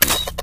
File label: sniper railgun no fire, weapons; tf